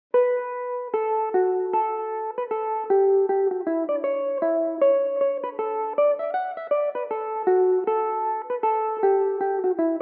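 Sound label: barndance D major belmuse, irish mandolin; The Teelin Barndance; Belmuse EM-190 electric mandolin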